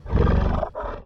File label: Majestic Lion Idle; Seer; GamigoNA